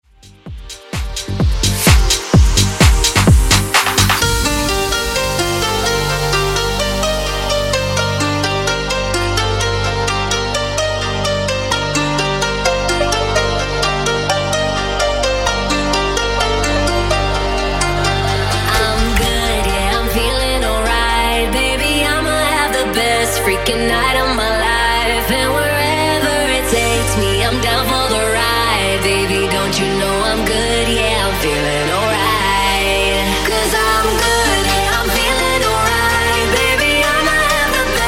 Top40 Tech House

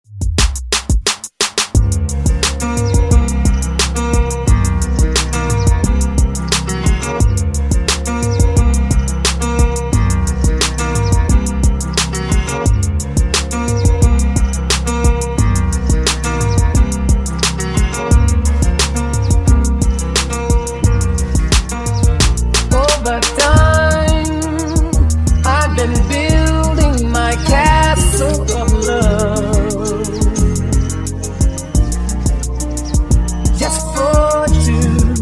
Funk Dj Intro Outro 88 Bpm

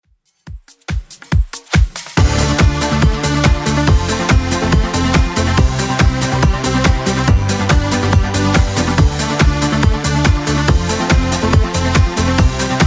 90s Redrum 141bpm

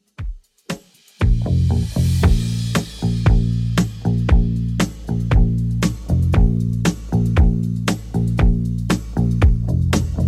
70s Redrum 117bpm

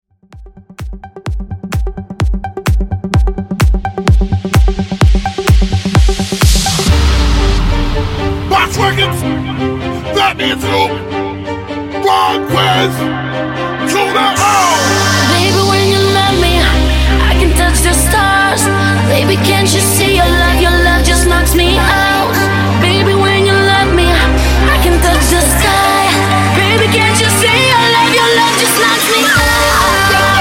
Bigroom 128 Bpm

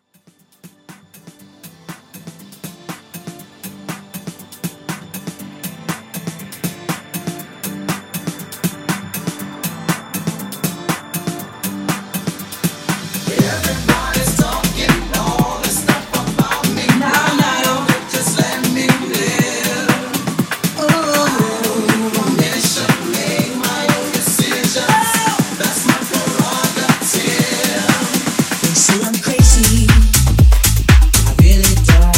Throwback Tech House Edit; Remixfreakz

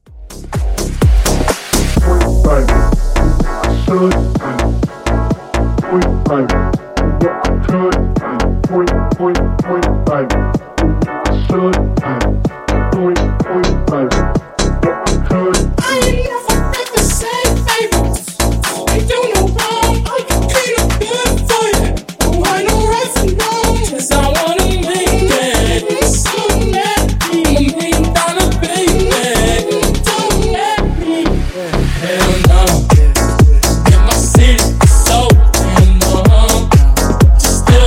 Funk Bootleg 125Bpm

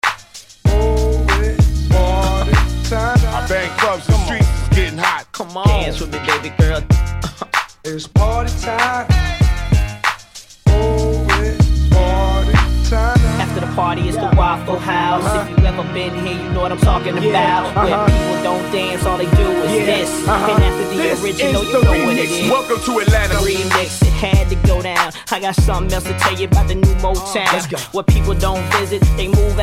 Funk, 96 85 Bpm

